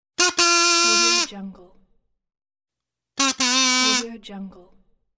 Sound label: kazoo ta da